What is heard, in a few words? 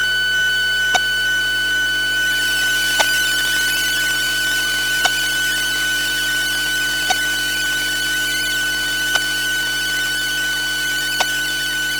Long Buzzing Power Glitch